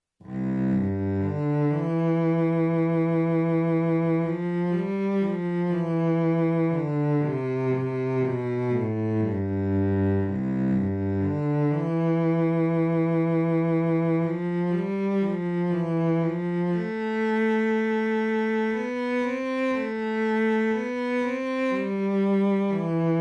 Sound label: solo cello; riviere marie line